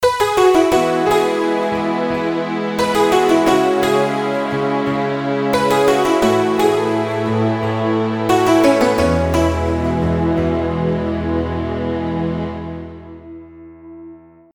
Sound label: PNO